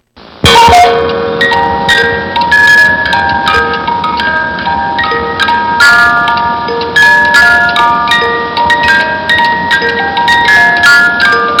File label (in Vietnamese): chuong cua